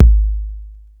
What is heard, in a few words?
bassdrum, tr808